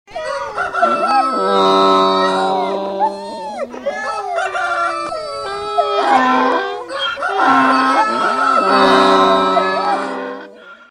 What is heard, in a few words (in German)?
orchester